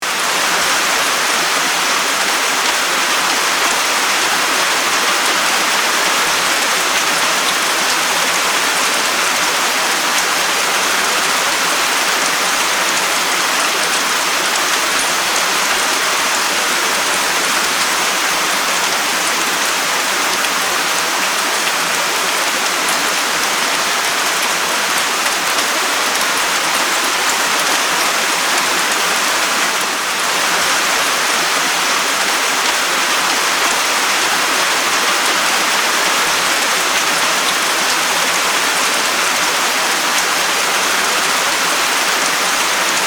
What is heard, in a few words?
rain calming